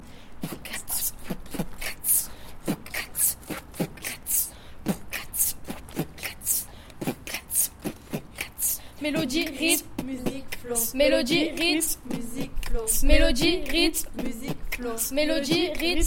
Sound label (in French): mélodie musique rythme flow